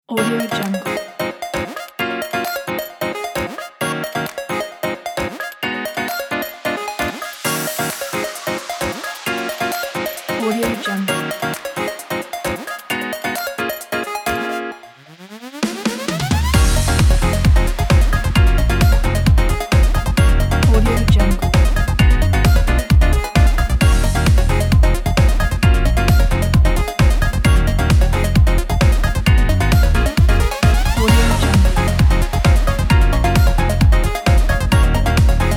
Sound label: jpop electro